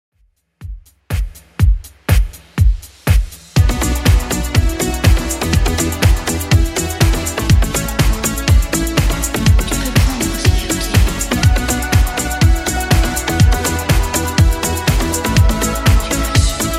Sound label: 80s Redrum 122bpm